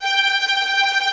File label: VI TRM FG4 R, Orchestra; AKAI Advance Orchestra Upgrade 97 Vol.1; VI TREM F